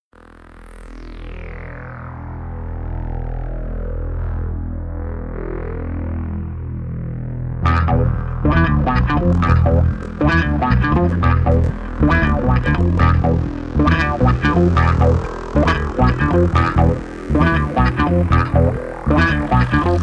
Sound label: Funk